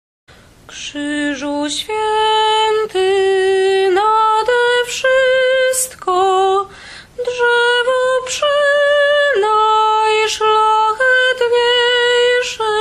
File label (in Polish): sopran; Krzyzu Swiety; Wielki Czwartek; spiew na triduum